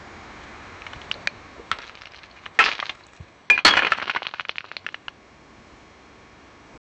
crashing sound of a heavy, building

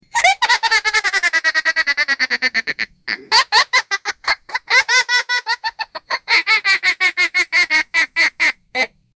monkey laugh

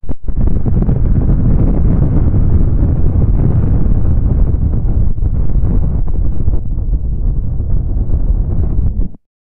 muted thud; physical

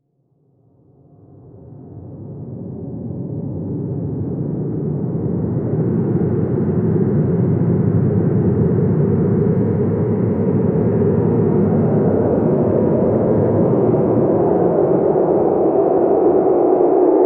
the sound of a large, astronomy

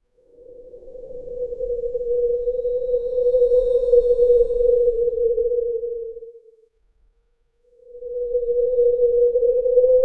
soft whoosh for rings, jewelry

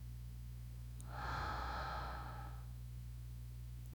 breathing

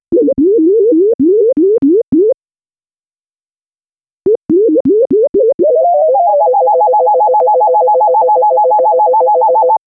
game show

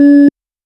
a soft denial, negative feedback

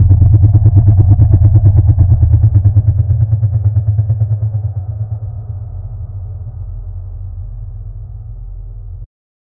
deep throbbing diesel boa

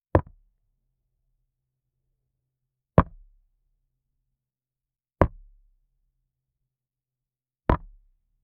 tennis

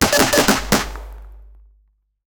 funny short rifle shot

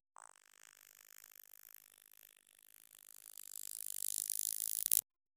maggot crawling sfx faint, insect

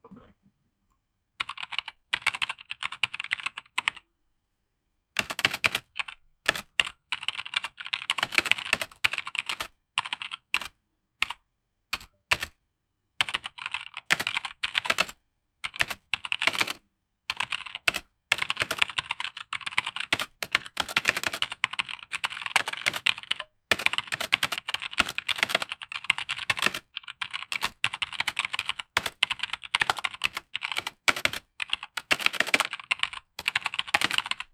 keyboard typing, computer

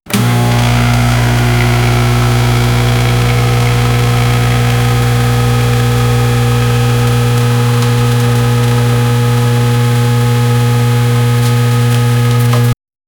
create background music t, lifestyle

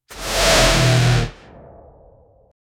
horror jumpscare swoosh; sound effect

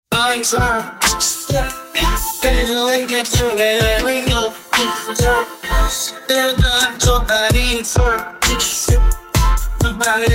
k pop pop akustik

